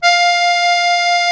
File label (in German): AKK VIOLIN, akkordeon